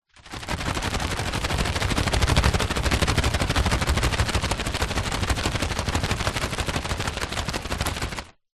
leisure kite nylon small shaking

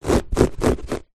fingernails scrape scratch carpet; foley

